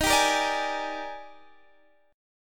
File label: D sharp Major 11th, down, Guitar, Half Step Down